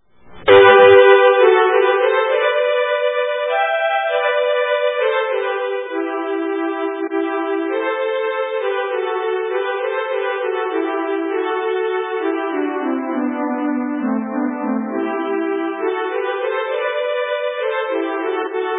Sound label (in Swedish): Lattens Vals